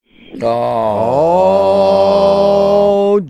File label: aaaahh oooohhhh; Voice